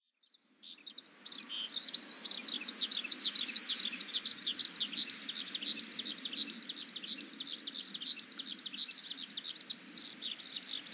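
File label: Eurasian Skylark; mooka; koe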